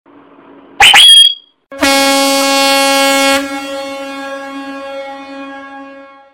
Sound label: Horn